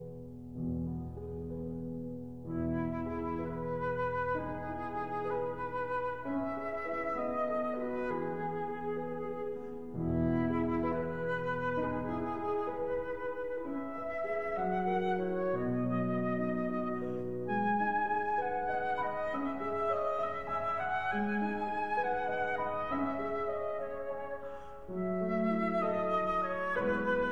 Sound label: Berceuse (Lullaby) for flute and piano; Gaubert, Philippe